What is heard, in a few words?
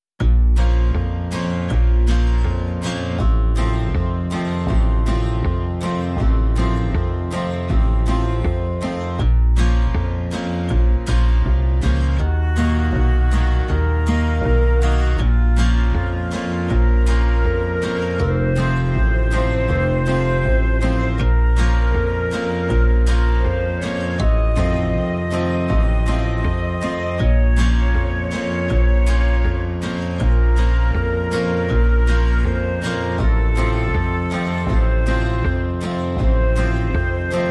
Gtr